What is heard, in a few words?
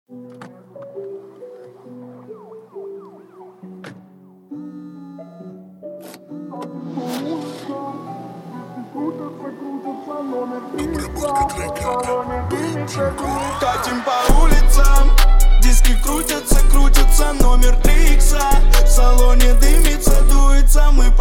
28-29-31Hz Low Bass